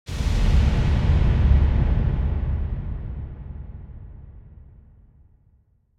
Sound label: Deep Distant Air Explosion; Hollywood Edge; sound designer's toolkit v2